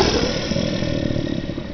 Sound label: slave die; gearbox; aslave